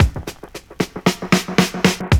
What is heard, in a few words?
Fills, Roland; Sound & Vision - Gigapack I; Perc